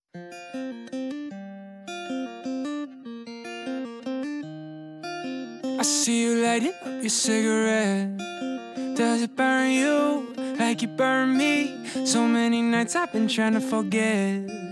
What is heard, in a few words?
Raincoat Acoustic